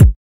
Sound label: short reverb steel kick drum sound c key